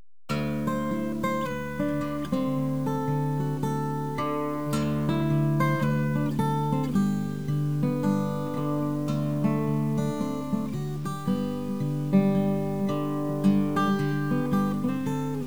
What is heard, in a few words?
music h6